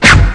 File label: strike; drums single hits; Weird and Interesting Hits